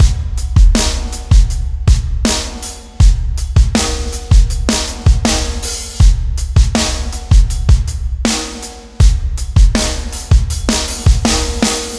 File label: Real Beat A, ambient; ambient vol 2